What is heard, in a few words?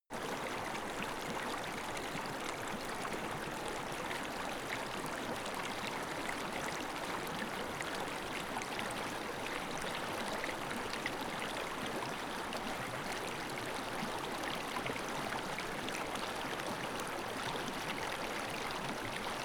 stream bubble light